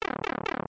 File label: pipe; smw